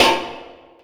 Wah Oneshot; Sonic Foundry (Sony Creative Software); Esoterik Beatz

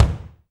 BD, DR BD; Best Service Gigapack 2

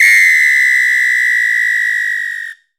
WHISTLE LO; GM PERC KIT

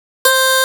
GUITAR 130 BPM delay; SONIC ELEMNT; SONIC EL YES

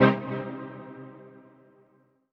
HOUSE, House Dance

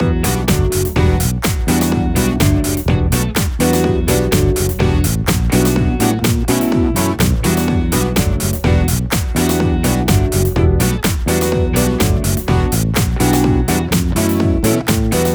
Full Mix, 125bpm Em, Nu Disco; Zero-G; Construction Kits

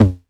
909 TOMS

